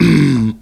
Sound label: "MMMMMMM", VOCAL